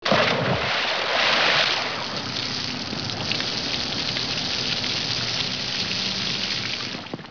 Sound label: oil